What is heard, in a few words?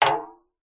impact metal light hit cooking tin water inside